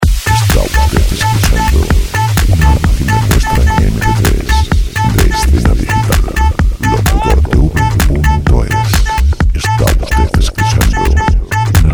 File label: 128 dance